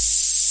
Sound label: cym, Ace Tone Rhythm Ace FR-1